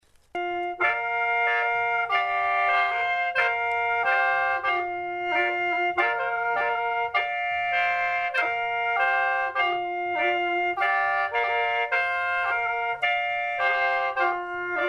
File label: lahu fulu, Yellow Lahu, free reed pipes solo; Lahu Shi Bala (Yellow Lahu); free reed pipes solo the Yellow Lahu use the same small reed pipes as the Lisu and other Lahu p